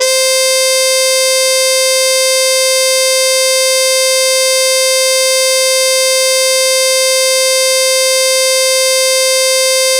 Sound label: MTrp, Yamaha DTXpress